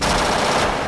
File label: gun, weapons